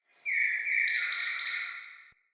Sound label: Cardinal Female Bird Song